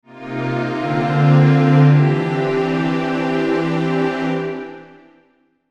Musical Chords, Musical Chords Strings Reverb Ascend; Blastwave; Sound Effects:Musical